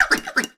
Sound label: Toy, notifications; Honor; 600 Pro China